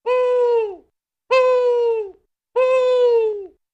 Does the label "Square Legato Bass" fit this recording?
No